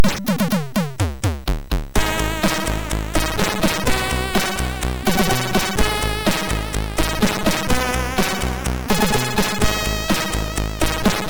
sid, MOS6581R3

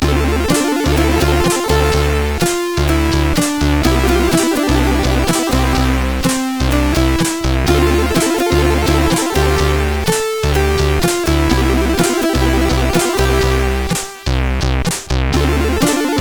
Jazz, CSG8580R5; sid

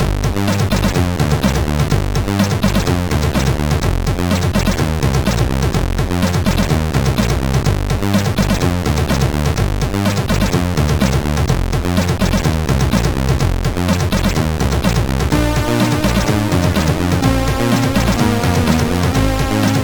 Introtune, sid CSG8580R5; FBR

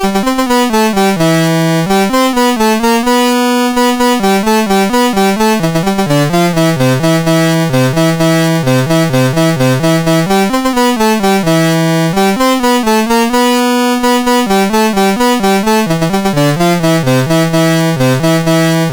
Cartoon Theme, MOS6581R4

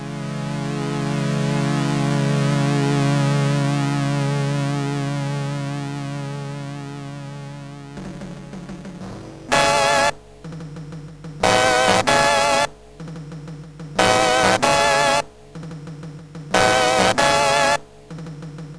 Orchestra, sid CSG8580R5; hvsc

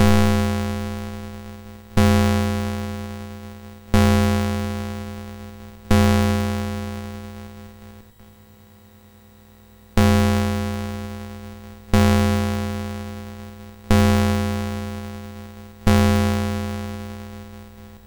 Ding Dong Bells, MOS6581R2

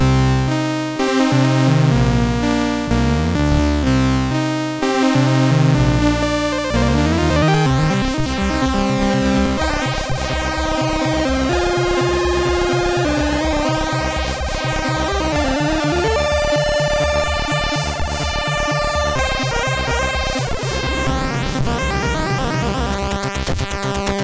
Loader, CSG8580R5; hvsc

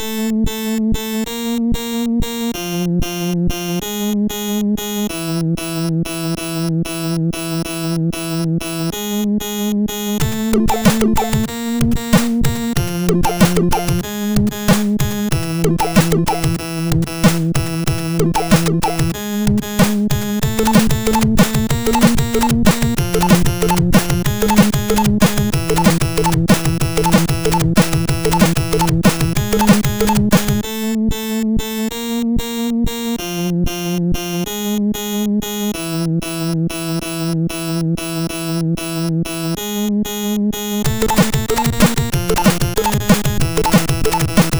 Hardcore, MOS6581R4

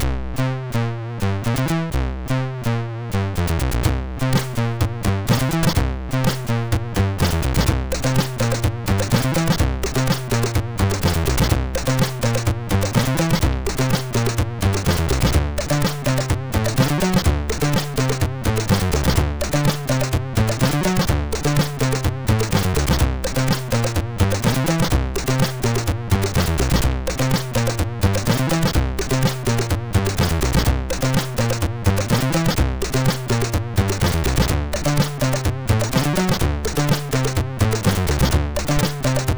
Intro Jam, MOS6581R2

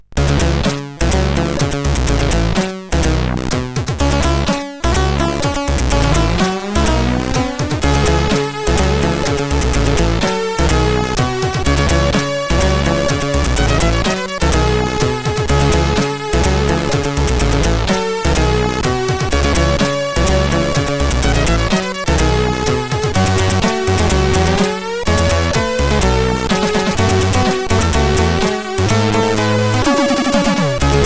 Short Tune, CSG8580R5

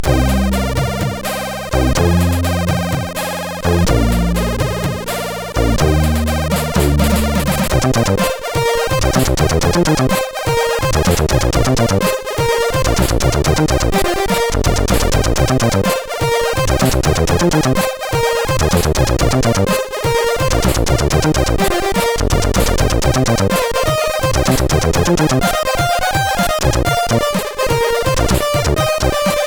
Remix, CSG8580R5

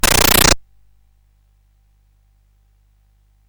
CSG8580R5, GAMES; Spikes Peak

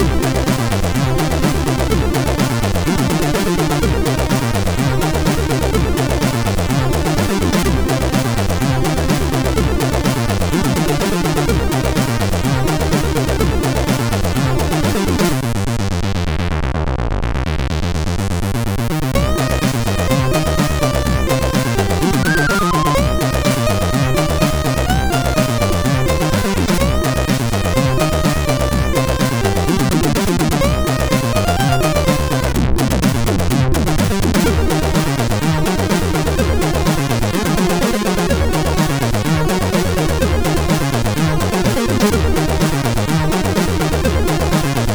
Mad Bassness, MOS6581R3

